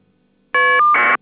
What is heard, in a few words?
FSK